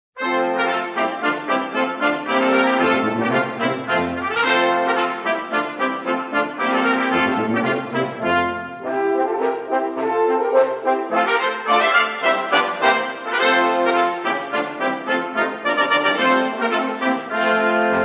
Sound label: cartoonic theme music tone ringtone